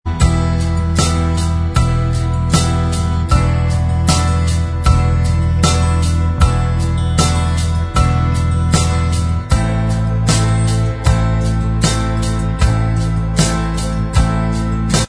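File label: instrumental, track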